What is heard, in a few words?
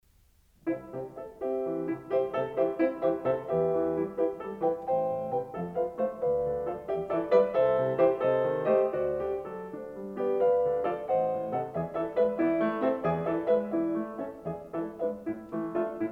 Piano Pieces Intermezzo in C Major